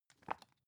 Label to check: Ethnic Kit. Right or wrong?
Wrong